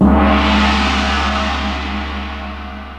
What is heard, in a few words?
Gong; Best Service Advanced Media Trax Modern Composer